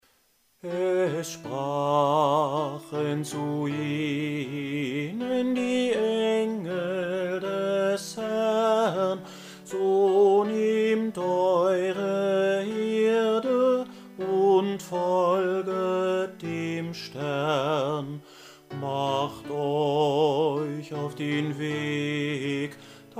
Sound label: bass